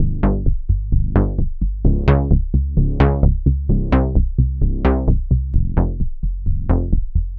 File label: Bass Line; Bass Lines; Eclectic Electronica